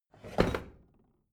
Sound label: bathroom drawer open heavy